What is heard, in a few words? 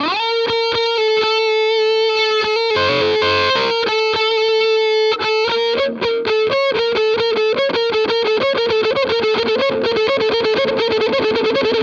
both bridge sustainiac; Schecter Jake Pitts